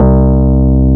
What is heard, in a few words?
PUNCHREEDS; Wizoo - Powered DX